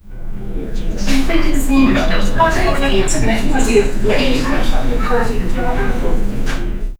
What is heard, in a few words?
library school light wall, library